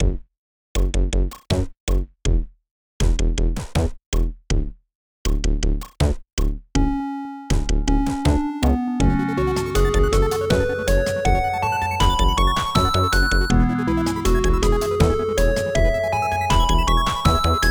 spacebound chill

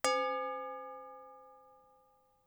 glass bell

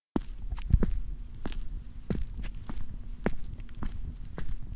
full steps stereo

